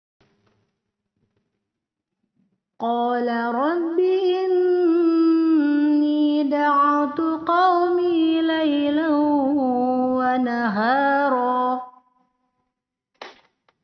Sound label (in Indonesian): Perekaman baru Karaoke denoised; cara waqof; cara waqaf